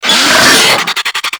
Infested Charger Attack, Enemies; Warframe

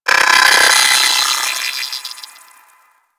Space Drone B Attack F; Warframe; Corpus Space Drone

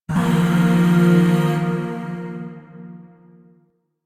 Shawzin Pent Min Chord E; Music; Chords; Warframe; Zariman Void Shawzin

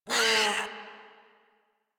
Kavat Vocal Pain; Warframe; Khora

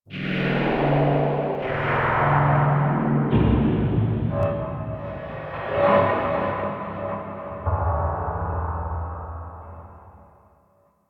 Exterior Transient Wreckage, Ambience; Warframe; Rail Jack

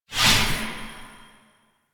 Lotus Hand Projectile; Warframe; Weapons; Duviri

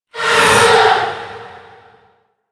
Rell Specter Vocal Attack; Enemies; Warframe; Priest Quest

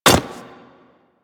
Hyena Micro Step Default, Footsteps; Warframe

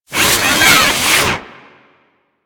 Arsenal Spin; Warframe; Liset; Hub; Machines; Tenno Arsenal

